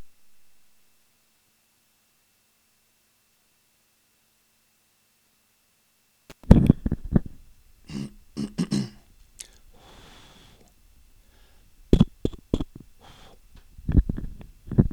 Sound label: Realtek line 100 percent